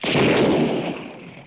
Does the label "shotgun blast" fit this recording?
Yes